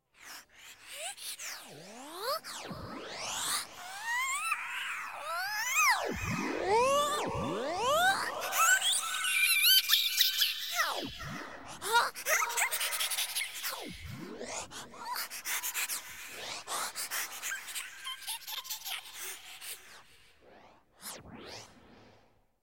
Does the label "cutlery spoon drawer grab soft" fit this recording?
No